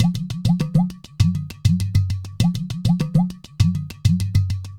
Udu

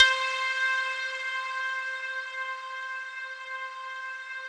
RESO METAL 2, Yamaha VP-1; Music Store

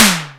TOM, TECHNO; 90 sSampleCDs; Crypton - DrumBase5000

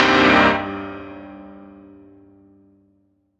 Stab C2, Club Techno; Zero-G; Stabs

